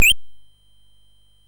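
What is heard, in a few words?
Mountain King, MOS 6581 SID chip music, games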